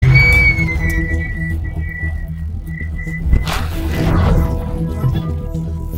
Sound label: kid scream sound with reverb; zack d films